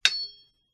metal mining pick axe fantasy material action movement texture; ancient game